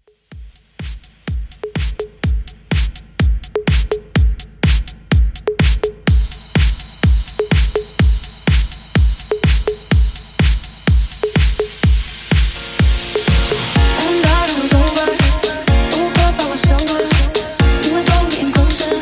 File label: Deep House 125Bpm